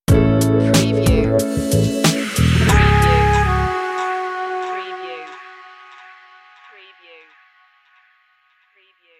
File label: jazz stinger